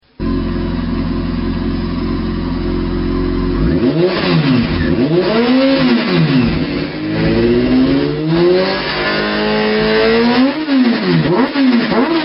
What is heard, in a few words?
CBR F4i sound, exhaust